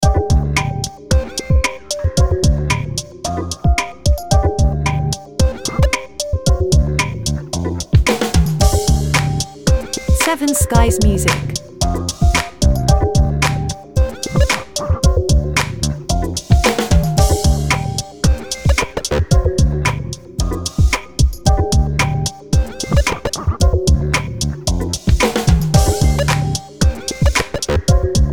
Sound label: Coffee Break loop